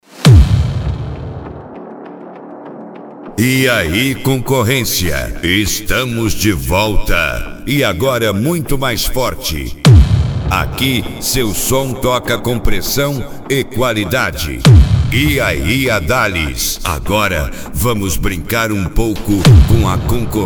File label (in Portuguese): esp de cavalgada